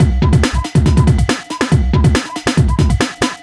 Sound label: LoFi Beats, 140 BPM